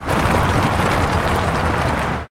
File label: tornado